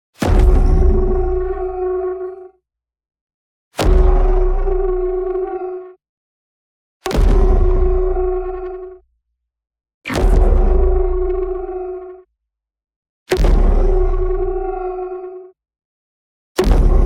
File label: PING; Zero Dawn; boom library; Neon